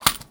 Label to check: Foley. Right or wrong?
Right